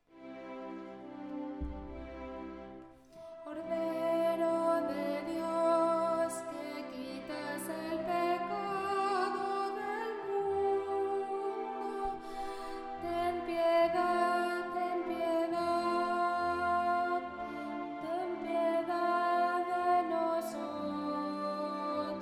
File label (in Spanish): soprano cordero de Dios